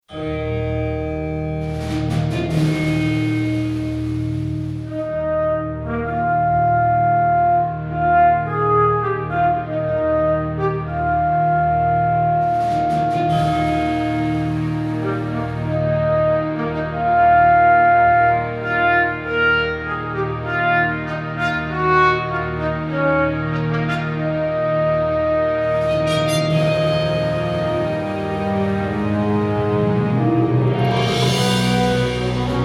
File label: horn section